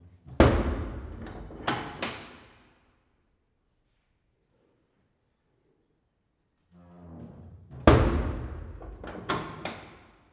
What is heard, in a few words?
Locking Cell S Door